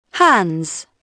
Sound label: hands; Dorling Kindersley My First Incredible Amazing Dictionary